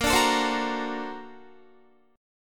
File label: Bb Augmented Major 9th Bb down Guitar; 4E,4A,5D,5G,5C,6F; Bb+M9 strummed; JGuitar